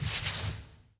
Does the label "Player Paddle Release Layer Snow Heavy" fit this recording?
Yes